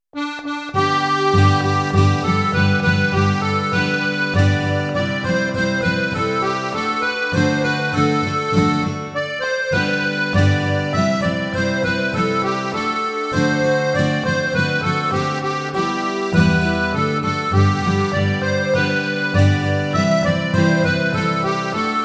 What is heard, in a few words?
guitar; Buergerlied